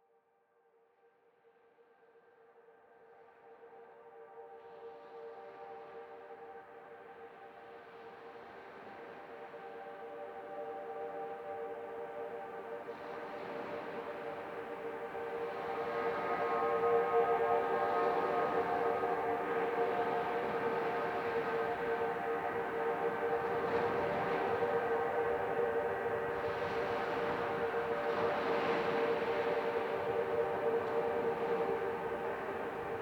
Soothing White Noise for Infant Sleeping and Massage